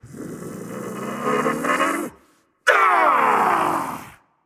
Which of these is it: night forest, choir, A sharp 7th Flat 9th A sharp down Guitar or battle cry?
battle cry